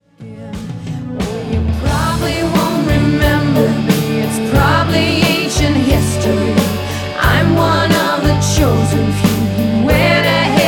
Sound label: female pops stylus